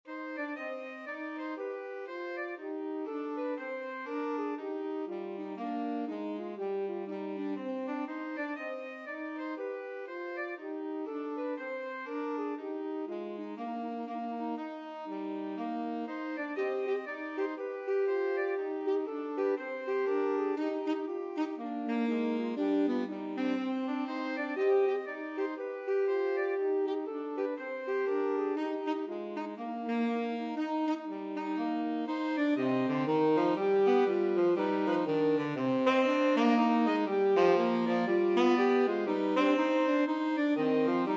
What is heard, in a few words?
bach bouree, 2 altos tenor sax